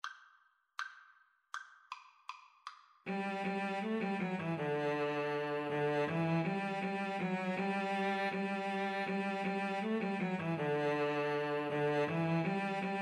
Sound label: Cello Duet